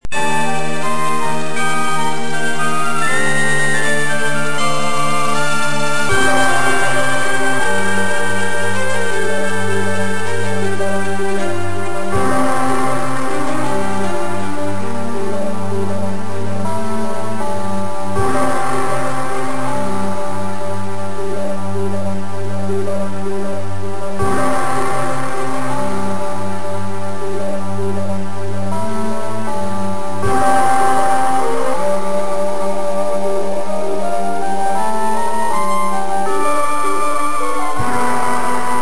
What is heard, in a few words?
Icy Cave; Shining Soul II